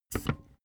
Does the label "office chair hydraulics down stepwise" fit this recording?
Yes